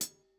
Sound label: Kit, breakcore Drum Hits